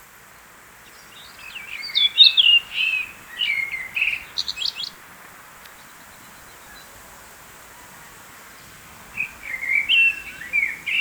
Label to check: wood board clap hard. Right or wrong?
Wrong